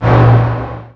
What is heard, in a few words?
Collision